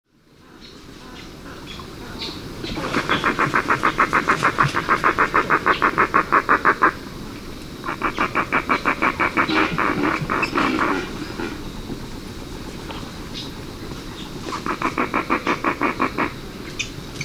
Roseate Spoonbill

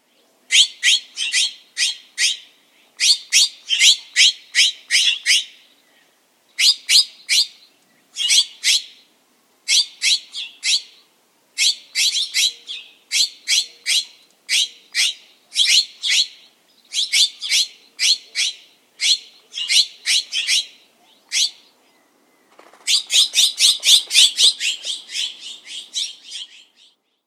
Mexican Jay

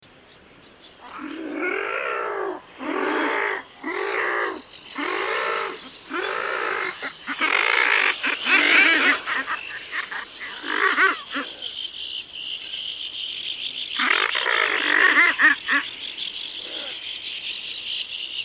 Thick billed Murre Uria lomvia arra

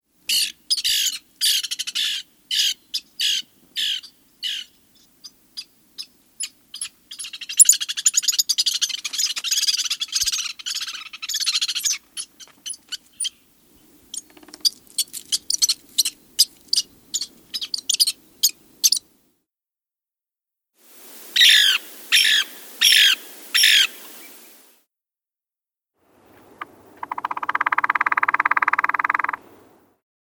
Lewiss Woodpecker

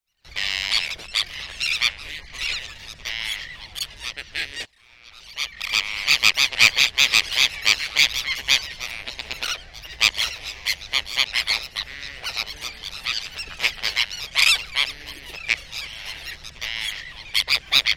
Sandwich Tern

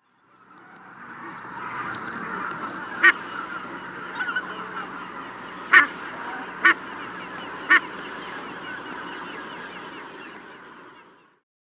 Rosss Goose

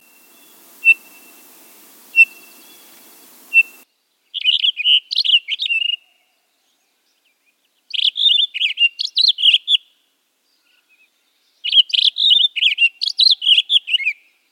Townsends Solitaire

